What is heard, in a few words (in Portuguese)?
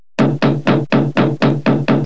bate port; 7invasores